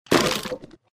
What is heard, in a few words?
Sfx Enemy Skeleton Dead